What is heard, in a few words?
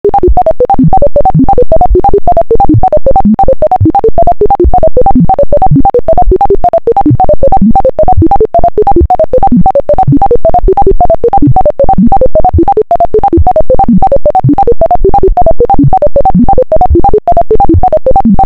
first bleep bloops